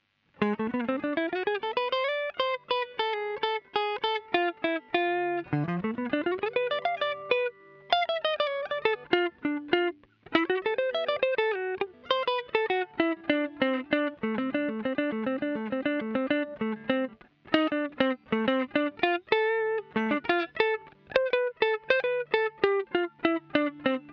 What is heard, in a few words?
minor funk